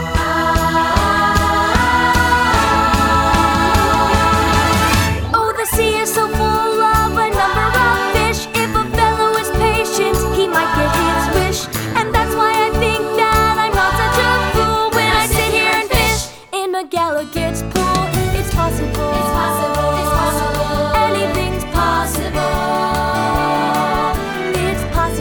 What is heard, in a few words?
vocal